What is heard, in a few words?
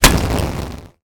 hit; smite; chaac